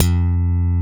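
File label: PRE-SLAP F3, bass; Drums In Your FACE!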